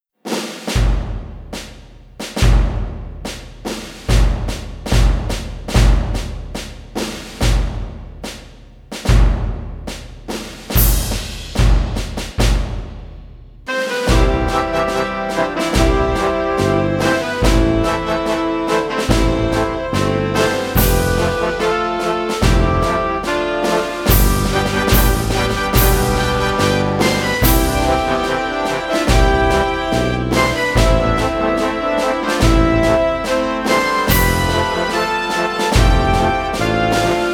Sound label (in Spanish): MARCHA DE PROCESION